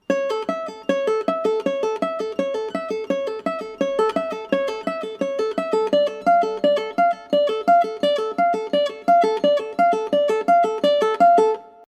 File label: ukulele slow